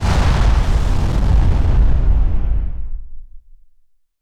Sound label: explosion rumble; distant explosion rumble